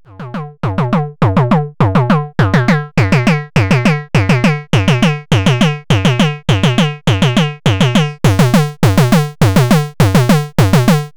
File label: Bass Arp; Body Synths Metal Fetishist